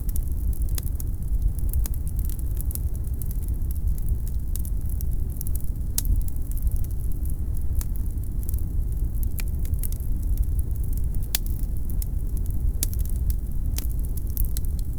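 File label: night sounds of the forest, fire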